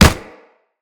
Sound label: Run Snow, Footsteps; Kubrow